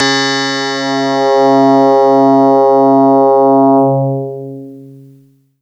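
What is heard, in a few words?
Yamaha DX7 Harpsichord String C3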